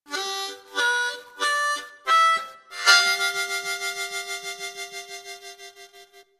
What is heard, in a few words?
Tongue, harmonica